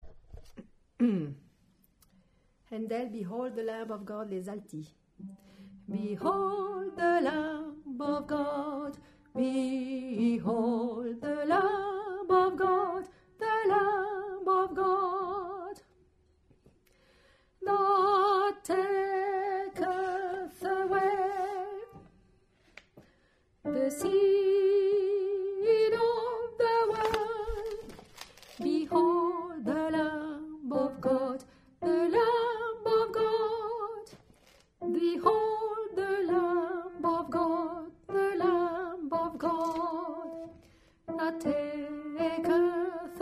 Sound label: Alto; behold